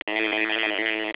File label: buzz